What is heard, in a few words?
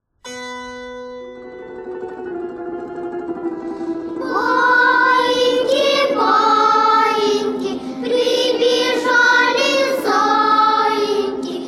lullaby